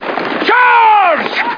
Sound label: CHARGE; First Knight